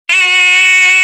light horn; gmod; svmod

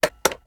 reload empty mag in; reloads; gmod

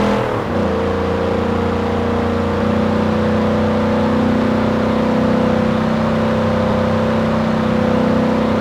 third, vehicles; dippy

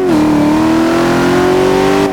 second, vehicles; f150 81

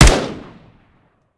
fire, weapons; gmod; viper

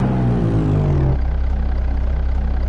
throttle off slow, vehicles; enzo

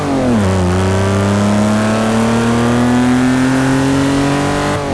second, vehicles; gmod; tdmcars; jag etype

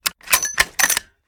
rechamber; reloads; gmod

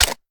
reload empty quick mag out; gmod; viper

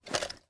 reload empty mag mag throw; gmod

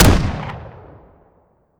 fire, weapons; gmod; viper

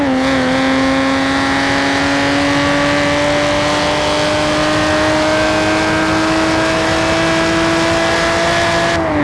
third, vehicles; gmod; tdmcars; fer 250 gto

